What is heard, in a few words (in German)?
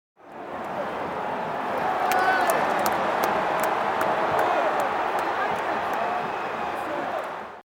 Stadion Atmosphaere